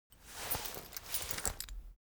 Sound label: cloth key ring mid size pocket fetch; foley; smartsoundfx